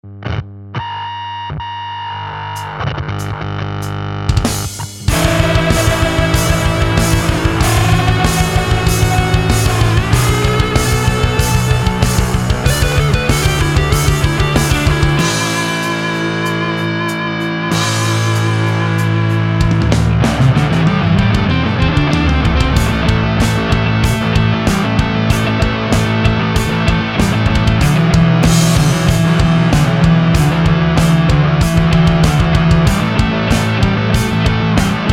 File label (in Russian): buzz fuzz, Гамма HM-3; Обзор Гамма HM-3